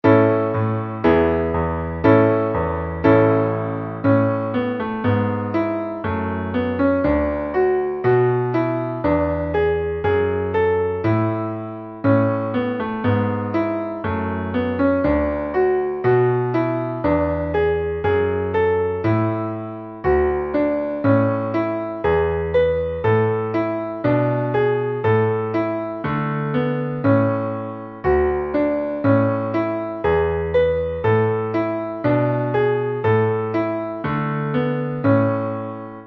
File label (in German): schnell Klavier